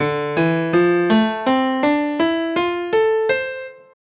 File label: hankdrum pygmy tuning